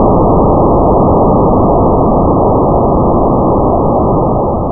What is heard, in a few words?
fire; Lynda C Game Programming 2